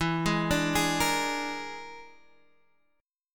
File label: A sharp 7th Flat 5th E